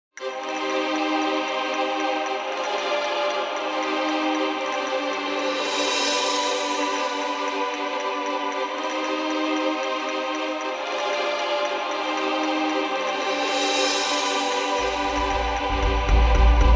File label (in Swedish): galopperande musik, Galoppernade musik